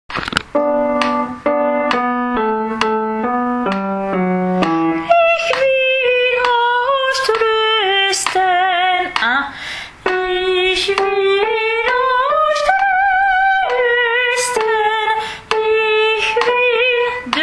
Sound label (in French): requiem allemand soprano; Brahms